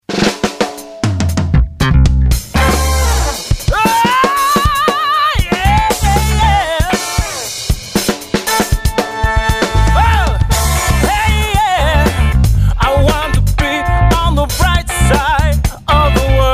Funk Funky

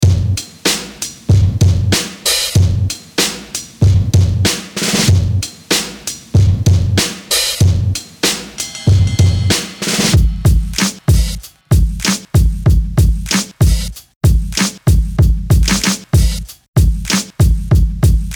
Vinyl Kits; maschine expansions; helios ray

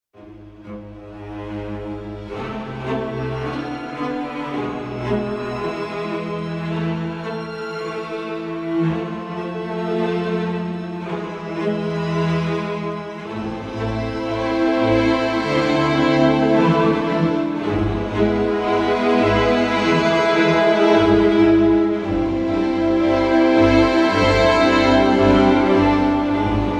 Rhythmic Textures

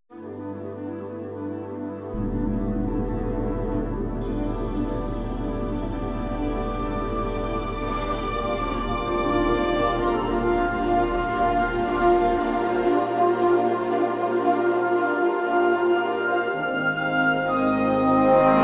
Ostinato Brass; Sonokinetic

